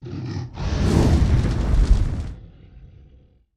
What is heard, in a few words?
Dragon Roars Breaths